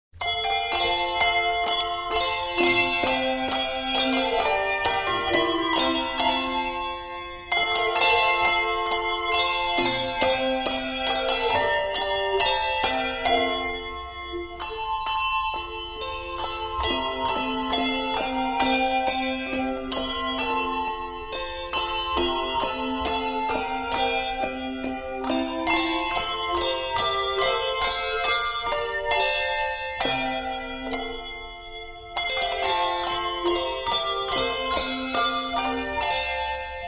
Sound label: handbell ringers, Christmas handbells